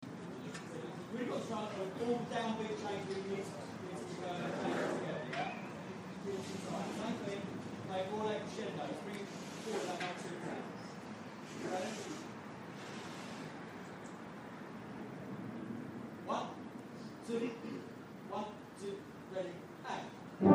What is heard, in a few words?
midweek brass